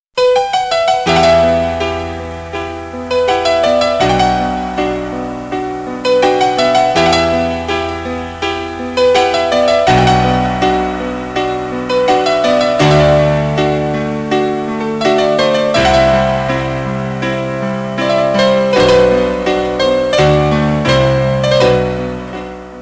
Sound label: Romantic Piano Tone